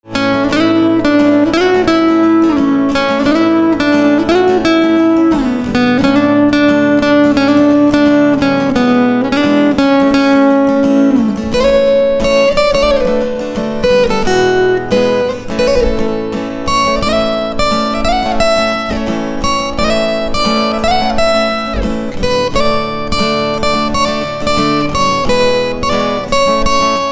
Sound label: Guitar